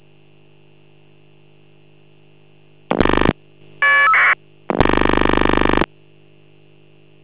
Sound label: fsk after ring